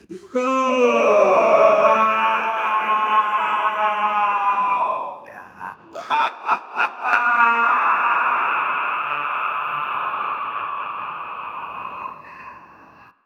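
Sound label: sinister infernal male laugh; evil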